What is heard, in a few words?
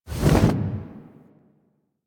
Exit Aim Glide; Warframe; Tenno